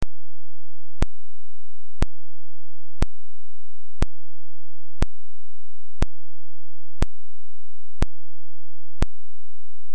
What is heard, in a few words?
pulse point5 hertz